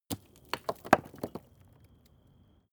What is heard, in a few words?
stone medium fall faint echo; natur-tiere; steine